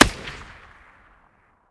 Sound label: m320 muzzle mono far, weapons